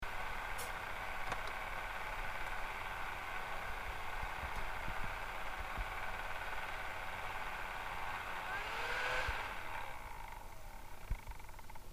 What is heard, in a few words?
fan